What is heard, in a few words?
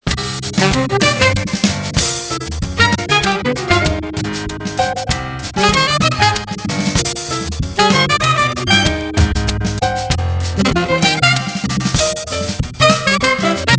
tango